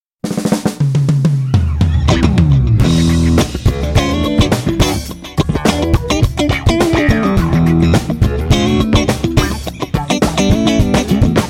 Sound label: triamp mkiii